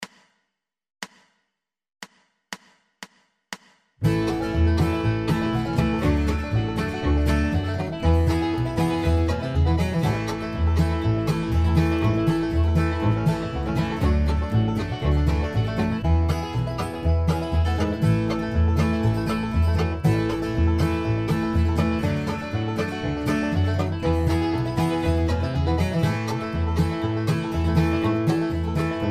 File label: 120Bpm, banjo